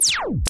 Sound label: comp shutdown; Starsiege